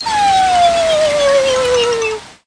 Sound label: Emote Crying Skeleton